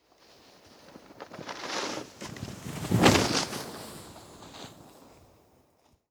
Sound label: Snowboard Passby; Wav Junction Sound Effects